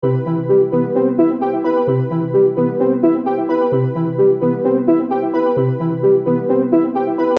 Infographic call ringing tone loop

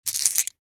Pearl necklace with beads movement

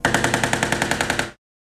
Russian PKM machine gun burst distant perspective

